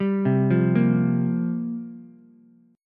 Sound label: F Suspended 2nd Suspended 4th F down Ukulele; arpeggiated; JGuitar